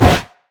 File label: great wraith basic attack; LoL